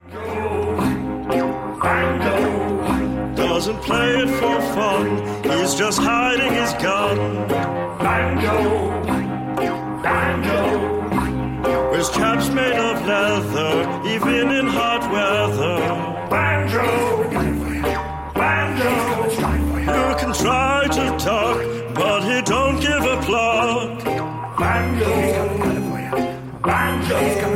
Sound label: Banjo; Bobs Burgers